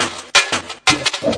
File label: 172 snares away; 161 to 180 bpm